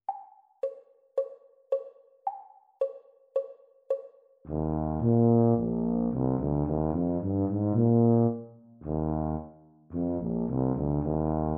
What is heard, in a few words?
Eb Tuba Duet; exam speed; Grade 4